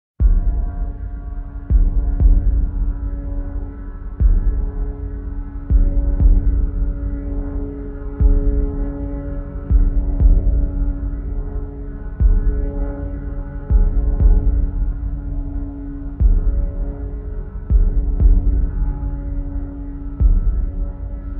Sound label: Gloomy Conspiracy (Solo Percussion Version); Nordic Drama Textures